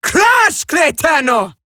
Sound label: attack, female